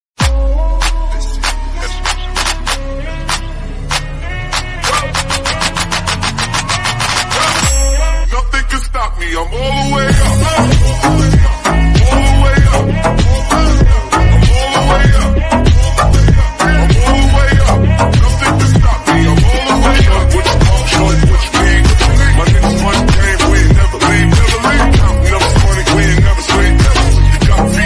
attitude bgm english bgm ringtone attitude ringtone